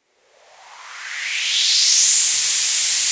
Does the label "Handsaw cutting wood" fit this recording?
No